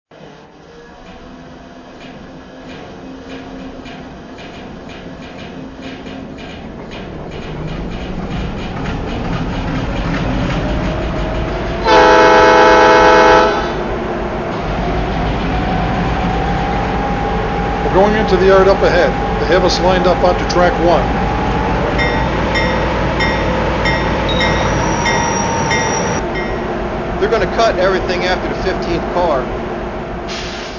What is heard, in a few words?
GE U50C